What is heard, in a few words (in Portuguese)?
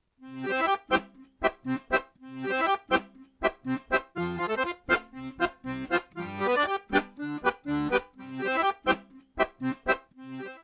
Acordeon Arrocha Simplificado